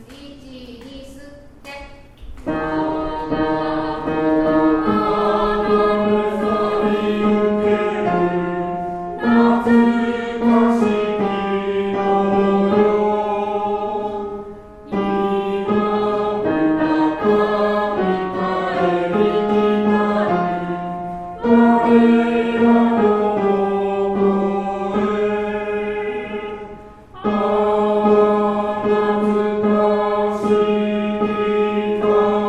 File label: baritone